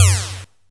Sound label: laser large; kenney; sci-fi sounds